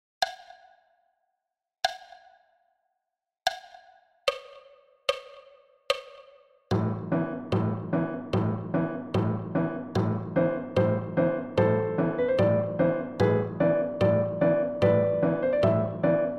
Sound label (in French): piano solo a 74 bpm